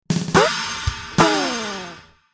Jingle Joke; Neighbours from Hell

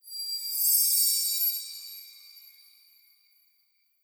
state shimmer

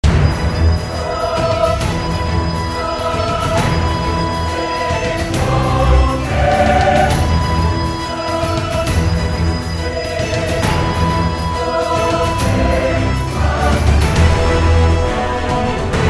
Celtic Titan Loop; Smite

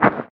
Ground short

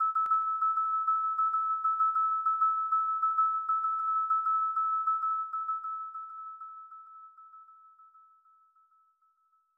Incoming Aircraft; Stealth; snes

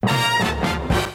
Trash Chute Music (Start); Jazztronauts

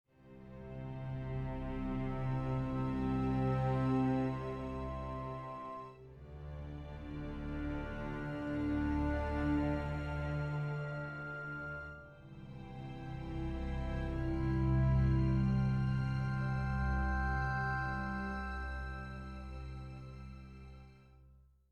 Hopeful

